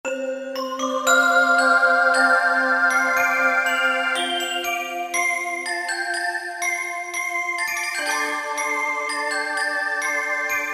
Gamelan Bells, Roland PN-D50-02

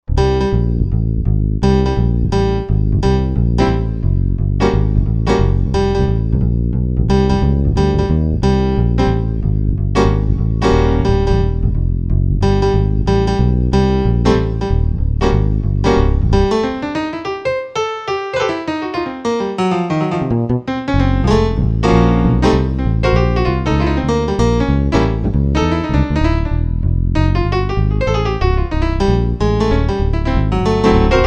Bass & Piano Split, Korg Wavestation A-D

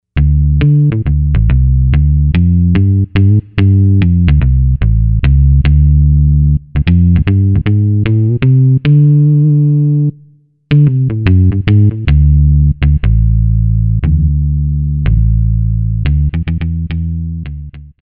Warm Picked Bass, Korg TR-Rack

